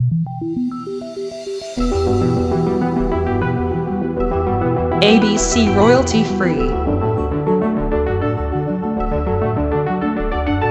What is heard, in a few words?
Minimal Documentary